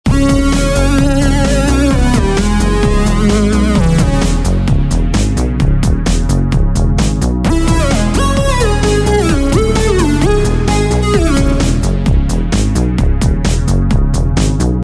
HACKING; fruityloops

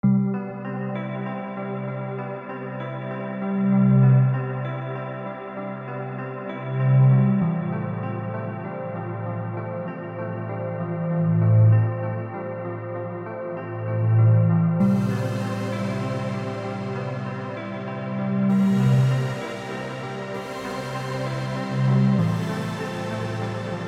echoes; fruityloops

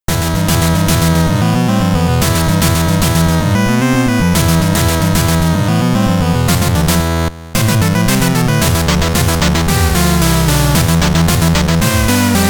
battle; famitracker

